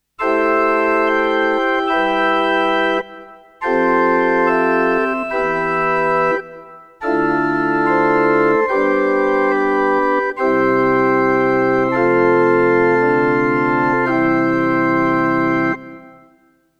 HP504 Orgel

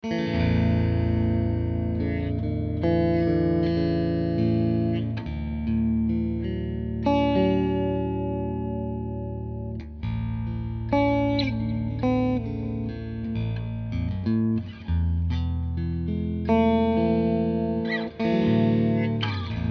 beide PUs

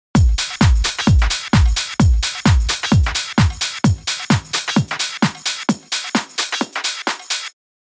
Loop mit Sony HP

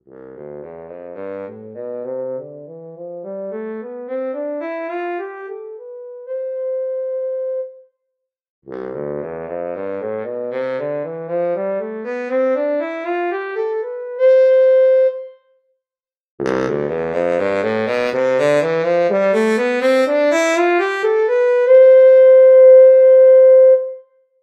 Tenorposaune Bucket

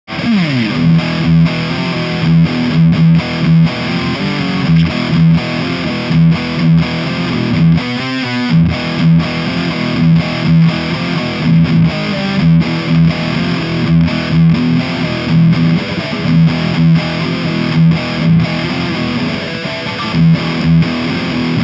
Riff Steg

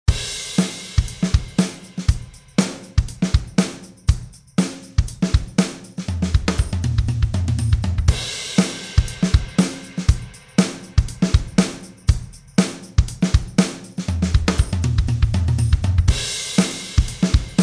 Drumbeat Tape Vergleich erst pur dann Oxide Ampex Studer

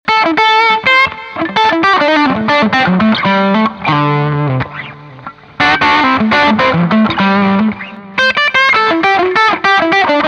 Fender Hot Rod IV Deluxe More Drive Kanal Drive 12 Lead

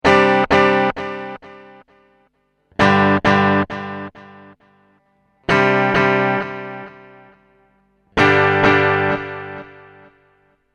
Bandecho heavy used

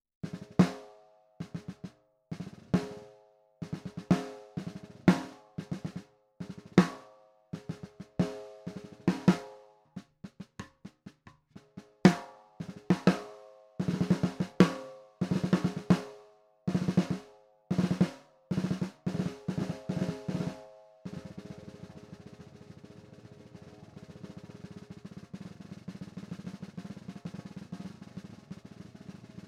Designer Snare Tiefe Stimmung

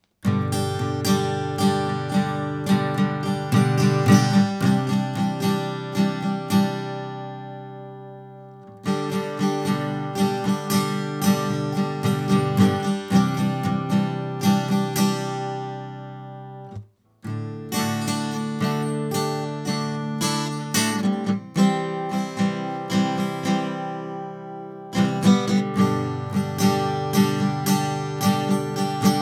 SSL SiX Gitarre

